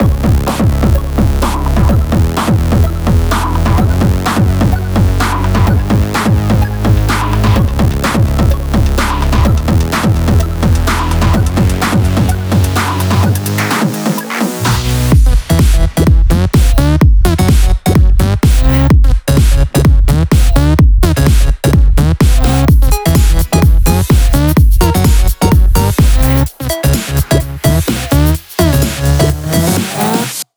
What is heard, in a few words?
127BPM Mix; Vengeance Samples; Warhog Kit